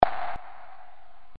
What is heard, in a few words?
click